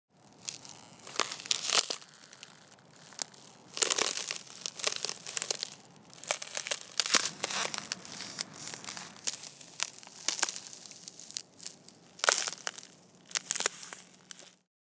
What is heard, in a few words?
Walking on frozen ice shoes crunchy cracks